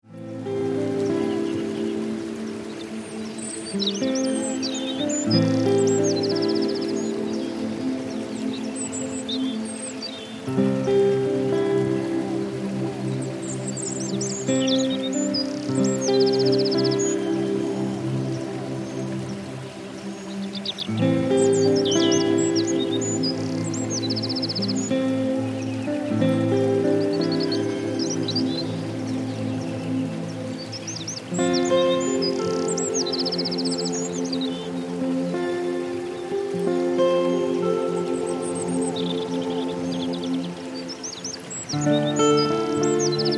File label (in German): Entspannungsmusik